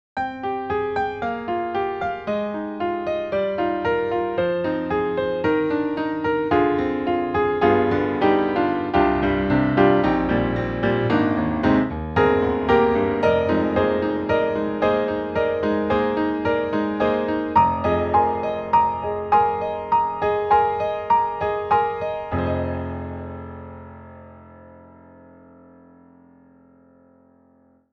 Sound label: EXS24 mkII Steinway Grand Piano; Apple; Logic